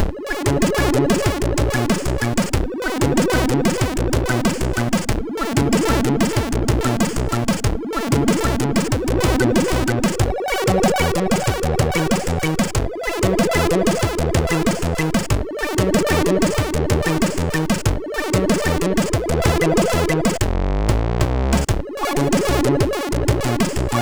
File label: Slow Funk, MOS6581R3